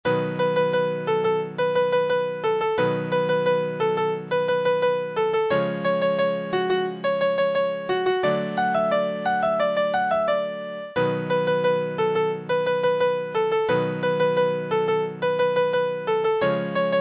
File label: Piano